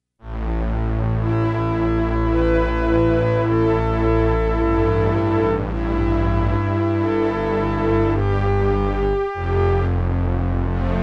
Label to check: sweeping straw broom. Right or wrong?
Wrong